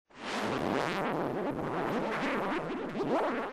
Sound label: cartoon; WMBonusXP